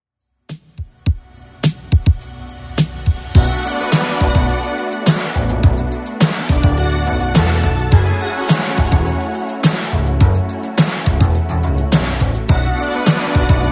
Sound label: 80s Redrum 105bpm